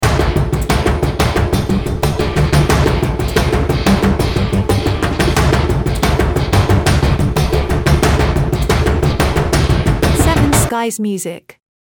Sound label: Action Drums Teaser, Loop